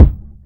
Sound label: kick drum f sharp key; kick drum samples